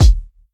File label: loud kick drum sample g sharp key, steel kick drum sound tuned to the G# note